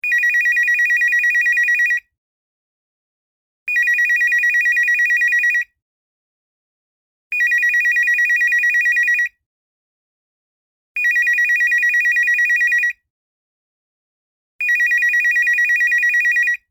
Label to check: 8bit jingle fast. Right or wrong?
Wrong